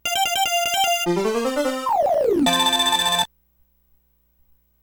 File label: Game Over Music; Pac-Land; PlayStation